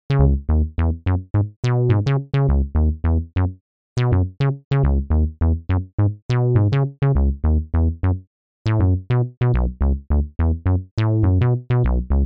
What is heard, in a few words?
Moog Reso Bass